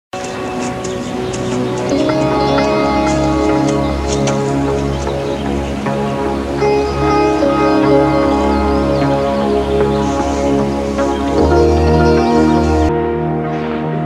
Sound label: dramatic theme